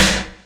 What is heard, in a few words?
SNARE; E-Lab; X Static Goldmine 2; akai